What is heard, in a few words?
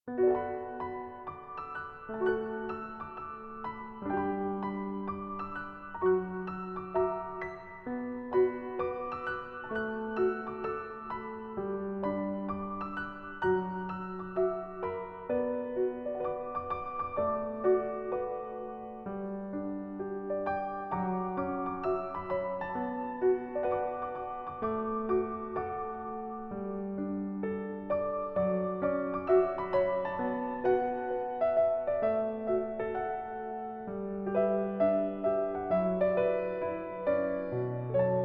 Ballad ver., Piano Essentials